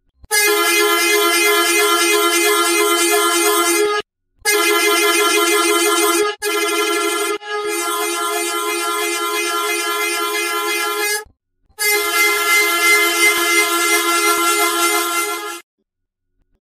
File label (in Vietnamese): tieng coi xe container